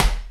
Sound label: KIDS SNARE; Crypton - DrumBase5000; DANCE3+TB303